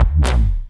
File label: C Bass, DnB One Shots